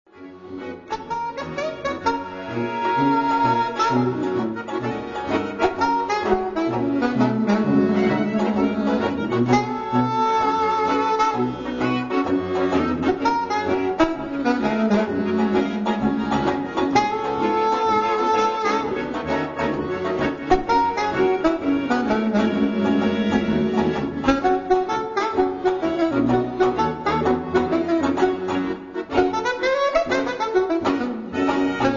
cajun

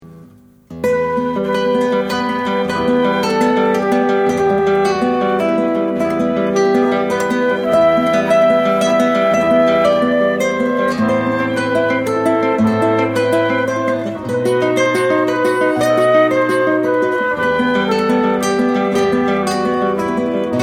spanish

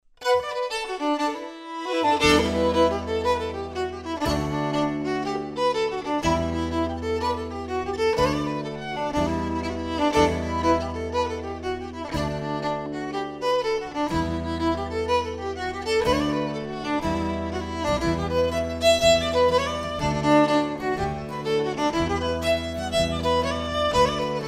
CELTIC TUNE

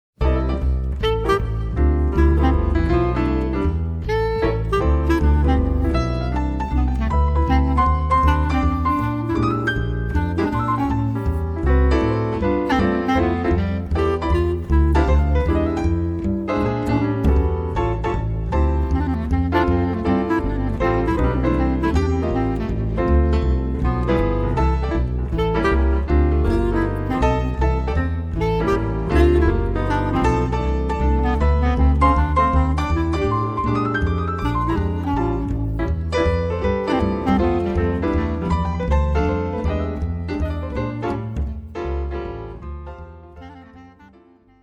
jz trio

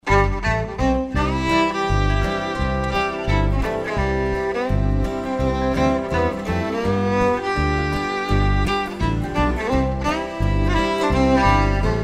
HILLBILLY SWING

